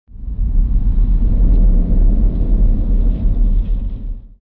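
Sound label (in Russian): гудящий порыв ветра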